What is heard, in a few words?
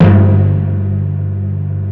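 tympani; East West; Global Sounds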